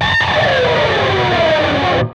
PRS SCRAP, Guitars; PRS SCRAPES

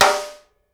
SNARE; PlatinumPhattCD1; E-MU Producer Series Vol. 8 – Platinum Phatt

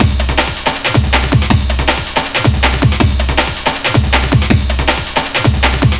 160, drum loops, drum & bass; Total Drum&Bass

